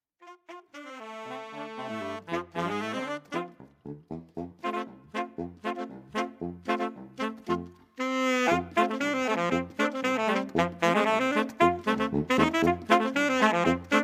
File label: saxtet